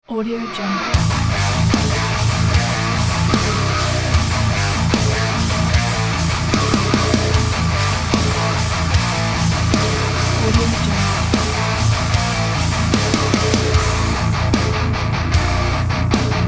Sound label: heavy metal riffs